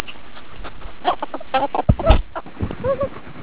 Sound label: cluck, ferret